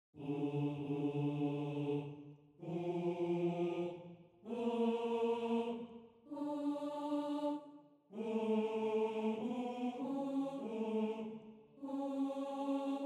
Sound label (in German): Tenor